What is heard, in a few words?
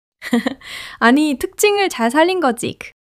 laughter